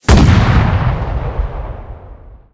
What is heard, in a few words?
tank big gun